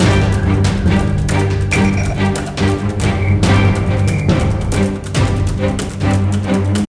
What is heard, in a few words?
Loops; Movie 2